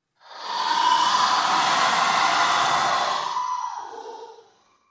augh

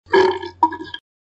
burp

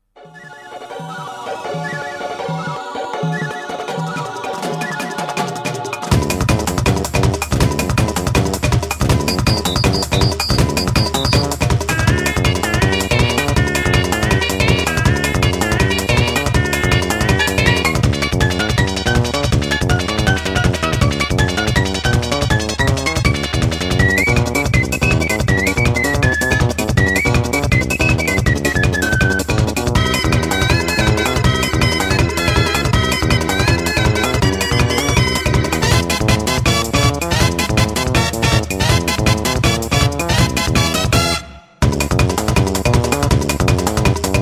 congrats music

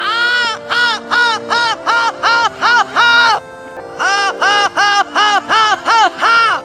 laugh; tidus

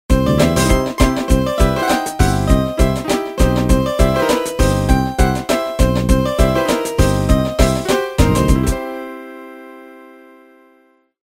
wrong backing

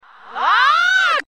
Goal, reverse

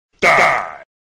die; cave

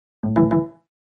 connected; cave

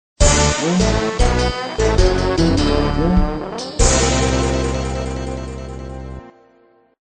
draw music

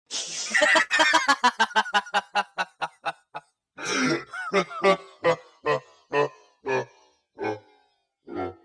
laugh

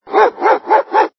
bark bark bark, reverse

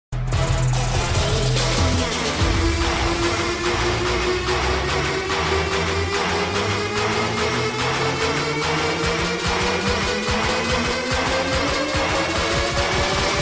bassdrop

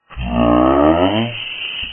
gas; vapesquared

